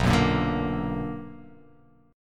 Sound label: B Minor Major 7th